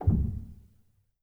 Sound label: rel, Grand Piano; bitKlavier Grand; Piano Bar